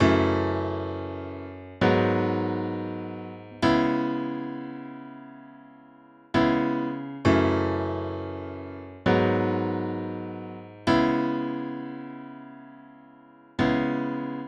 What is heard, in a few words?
Steinway Piano R&B 265 bpm